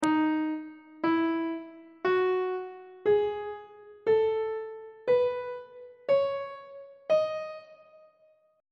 d sharp locrian mode note positions on treble clef